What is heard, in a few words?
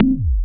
Industrial Analog Single Notes Short